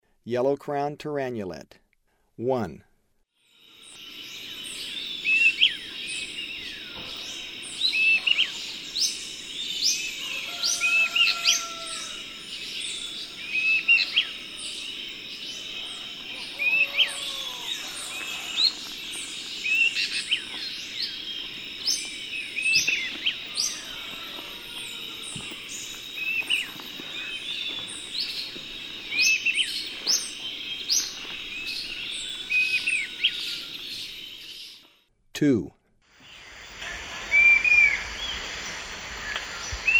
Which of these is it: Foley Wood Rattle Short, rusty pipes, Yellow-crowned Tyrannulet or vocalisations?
Yellow-crowned Tyrannulet